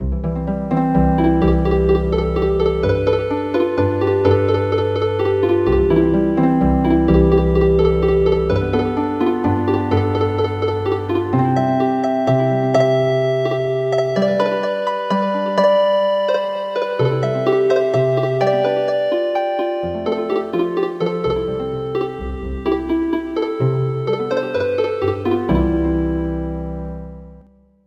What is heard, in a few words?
Healin Harp; traditional; Sunday going to mass